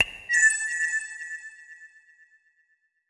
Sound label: Card Xyz In